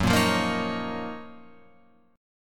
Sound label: F sharp Major 13th Guitar Open F; strummed; JGuitar